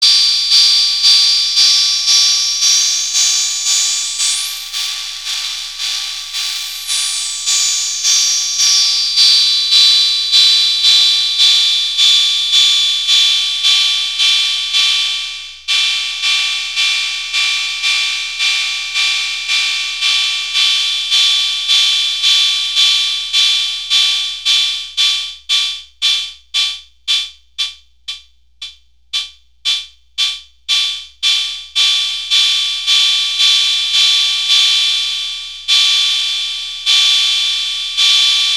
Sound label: cymbal with mods; dr110